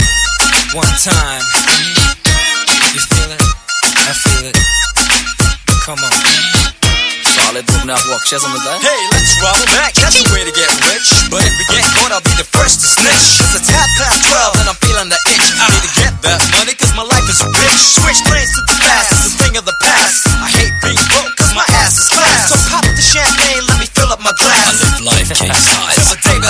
English Hiphop

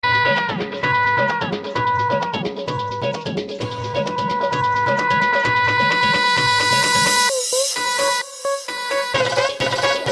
Ooh La La Rock

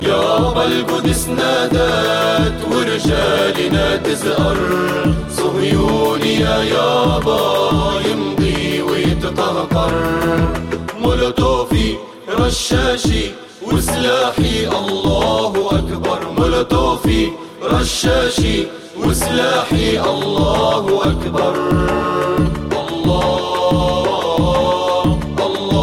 Allahu Akbar

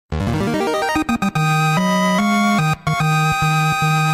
Fanfare; FFII

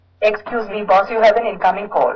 Incoming Call

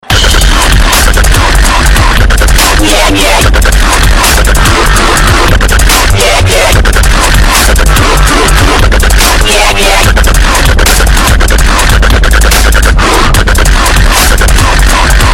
Relaxation Dub

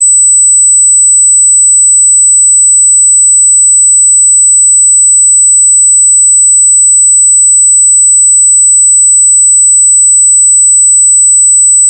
8000hz Sound

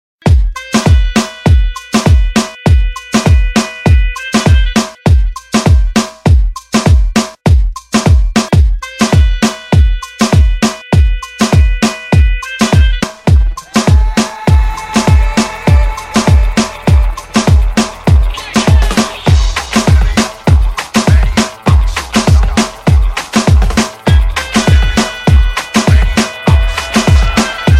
Dhol Mix

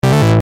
Fast Techno Notify

